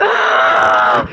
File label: raaahh